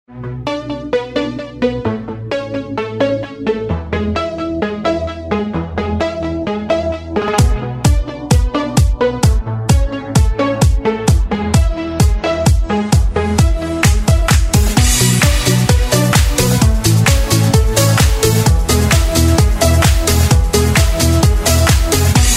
instr

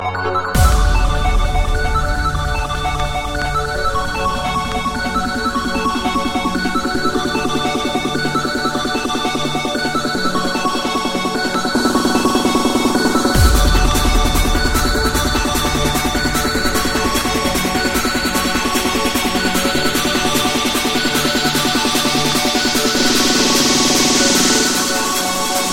remix

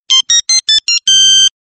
soprano ring

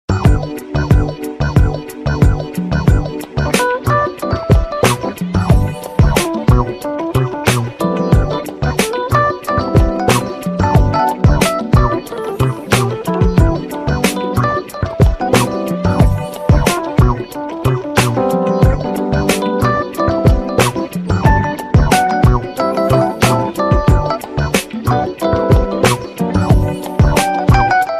jazz mix

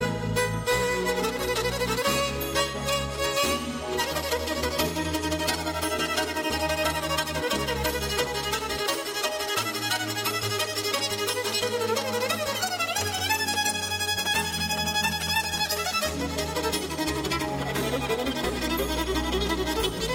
violin

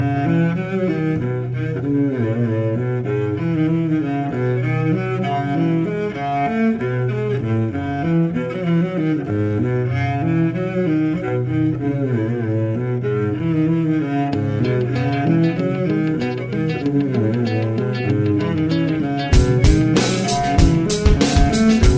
great violin

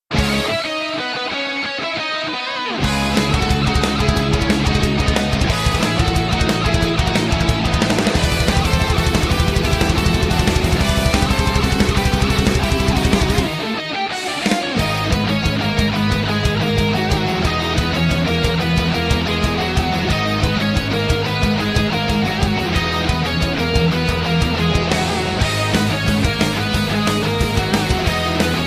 power metal; attack on titan

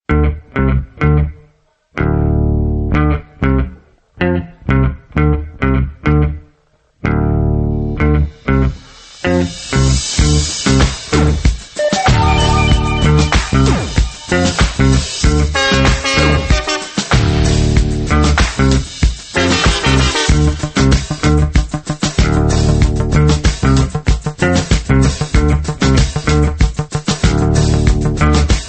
guitar with love

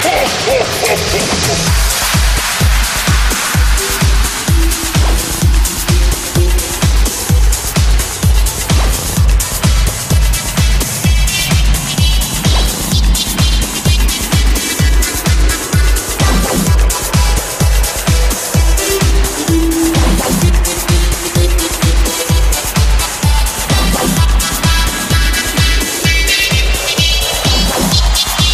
electro christmas

